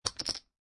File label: Poker Chips Multiple Dropping On Felt; Poker chip drop on felt: Multiple poker chips being dropped on felted table; Lynne Music